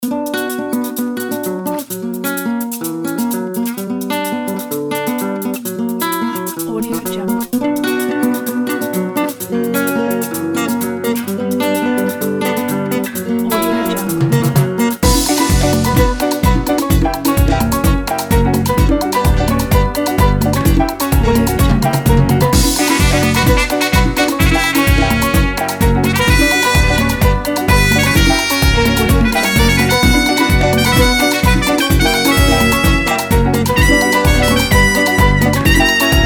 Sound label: merengue latino